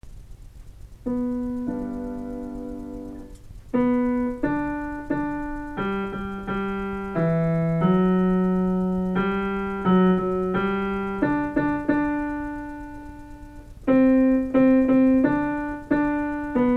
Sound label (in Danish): tenor